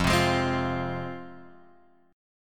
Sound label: F Minor F down Guitar Open G